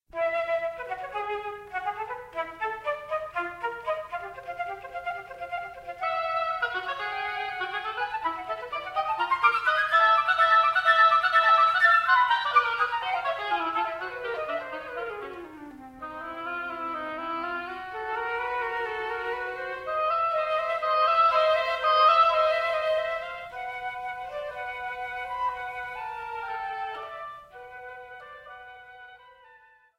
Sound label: Duo for Flute & Oboe Sonata